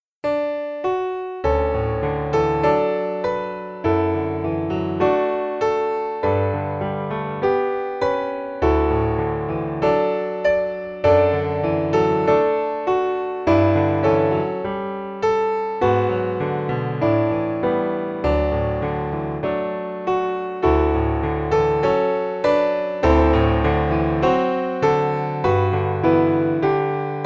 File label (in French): Piano seul; Michael Rows The Boat